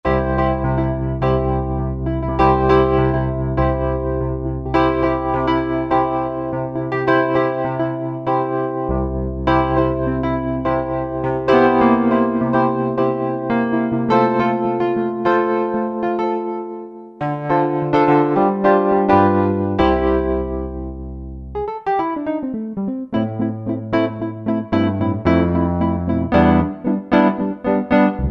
Wurly, Alesis QS8; TrampWurly; Factory Preset examples

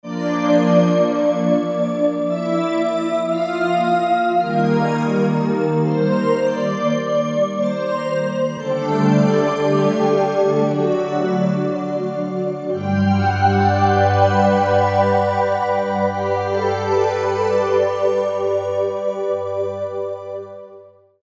Aero Glide, Korg MSC-2S Synth; Synth 1

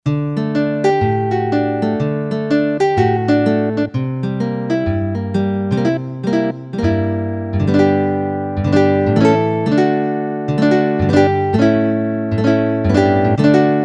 Nylon Gt., Roland SC-880; Factory Tones

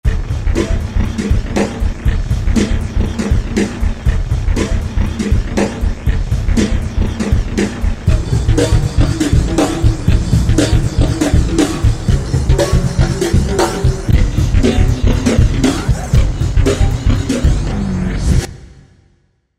Roland V-Synth Bit Down Beat; Factory Patches

